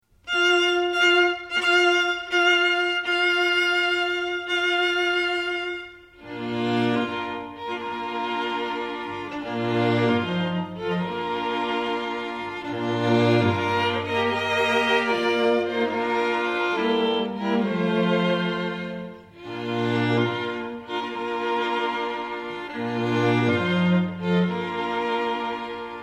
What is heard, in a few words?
Wedding Processional, string quartet